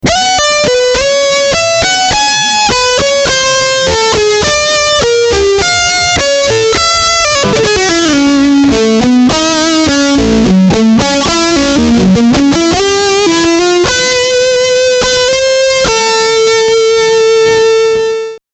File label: Lead High Gain